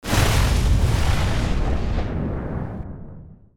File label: magic flame